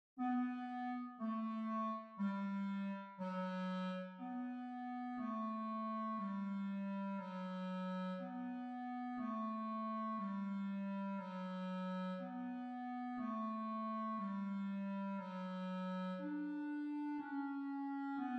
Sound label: swing